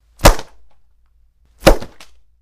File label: Wet Chamois Snap Impa, cloth, chamois